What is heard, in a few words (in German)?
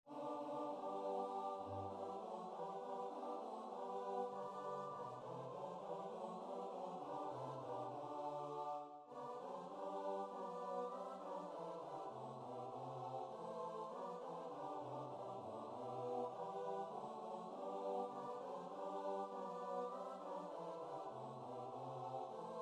chor; Buergerlied